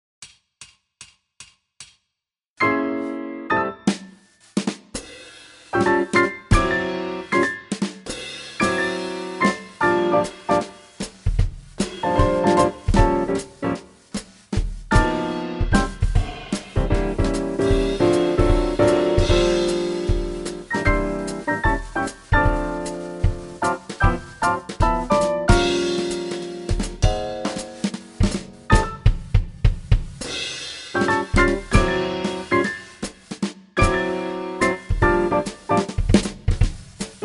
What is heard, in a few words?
minus bass